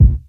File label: round rap bass drum one shot c key; tuned drums